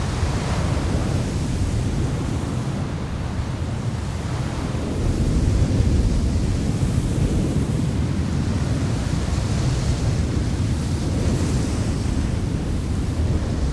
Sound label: Mountains Windswept Loop Quad; Journey